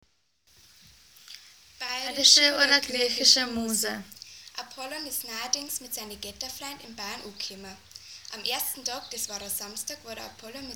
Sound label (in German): Musik